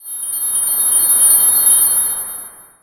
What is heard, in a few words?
Pickup Magic Bells; SFX Kit; Pickups